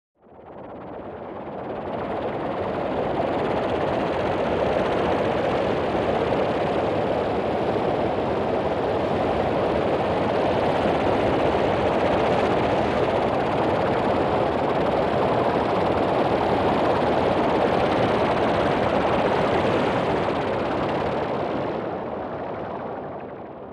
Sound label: Synth Generated High Frequency Pulsating Bubbles; Electronic Sci-fi